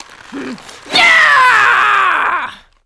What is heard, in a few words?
taunt, female; quake2; tourney